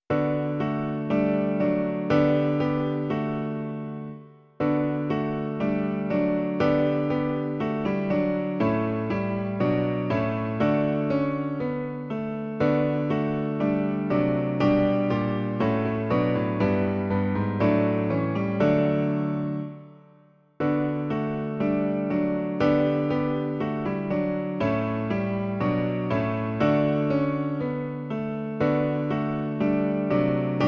klavír, Klavírní verze

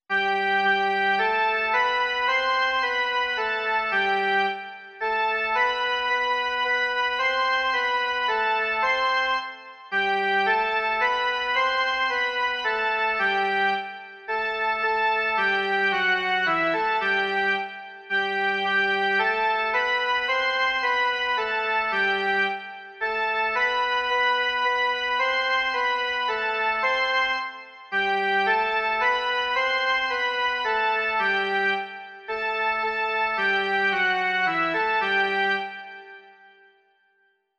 jen melodie; Hospodine všemohoucí

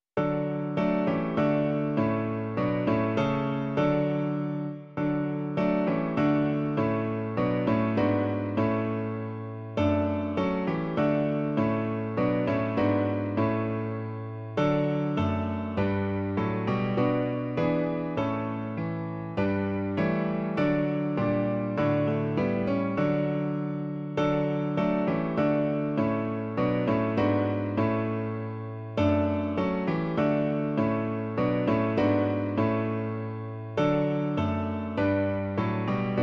Skloň se k nám svou milostí - klavir; Klavírní verze

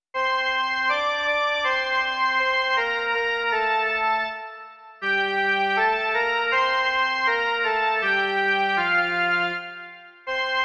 jen melodie; Již Babylon hříchu klesá

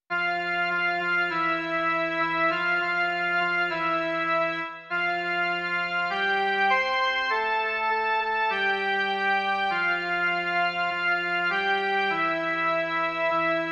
Zůstaňte zde a bděte jen melodie

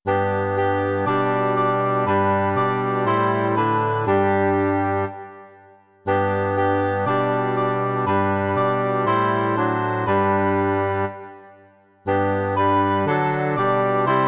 2 anglické rohy, fagot a tuba; Bože, jenž jsi Krista nám dal; Imaginativní verze

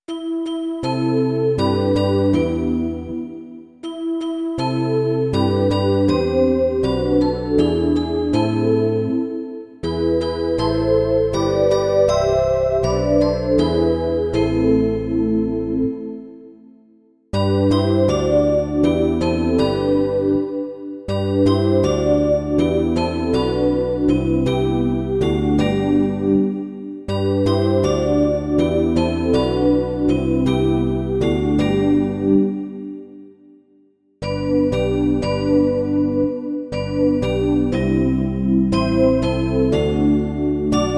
syntezátor a baskytara; Kyrie; Imaginativní verze

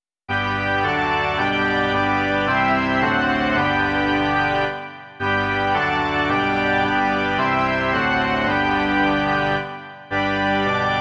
varhany; Otče náš všemohoucí

